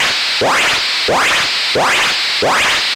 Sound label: WHOOSH